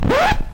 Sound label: record scratch